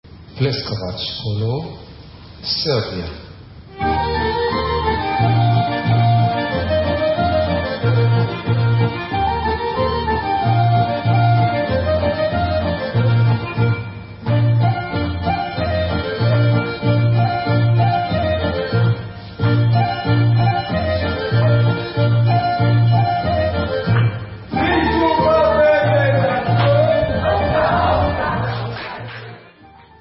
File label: Kolo, Serbian Instrumental; Pleskavac